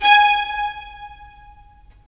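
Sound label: strings violins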